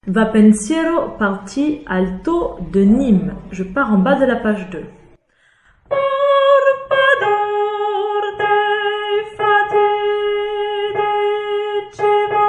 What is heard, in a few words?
Alto; Va Pensiero; Verdi